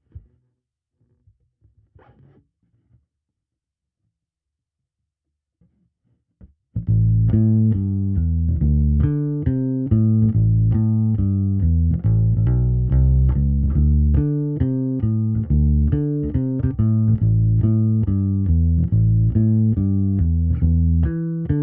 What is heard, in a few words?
SVT CLEAN BLUES Bass AMPS